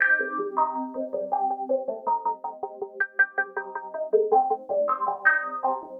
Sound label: synth, ambient, 160, C; Keys; eJay